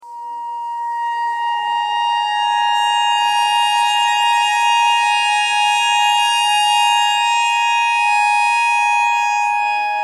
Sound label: clarinet notes e clarinet notes e pentatonic